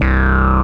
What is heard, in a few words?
FILTR BASS